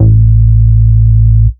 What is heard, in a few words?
Bass Root A